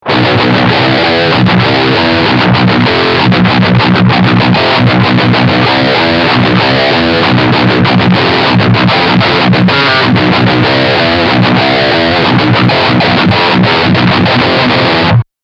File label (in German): Riff Distortion Steg Pickup